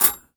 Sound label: Foley